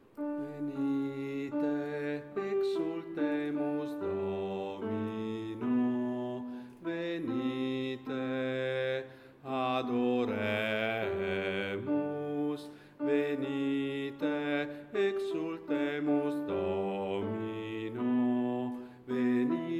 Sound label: Venite Exultemus Domino BASSI; Avvento e Natale 2016